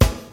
clear steel snare drum g sharp, Royality free acoustic snare sound tuned to the G# note